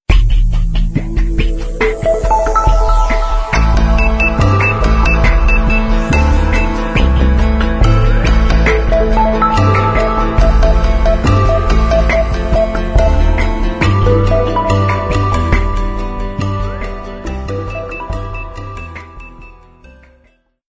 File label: ringtone; Gionee; GN305